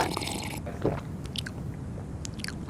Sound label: drinking water; M8; percussion and foley